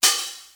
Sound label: Open HH, DL-909